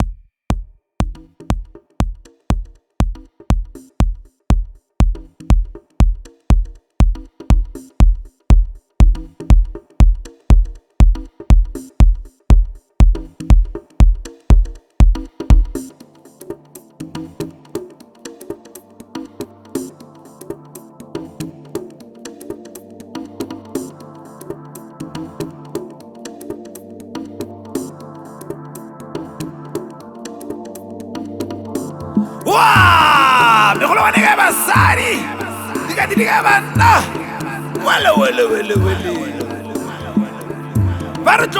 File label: sax